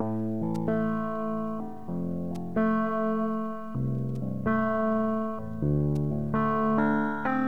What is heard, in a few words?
bass, Loops, Sad